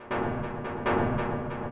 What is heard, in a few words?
beat; garrysmod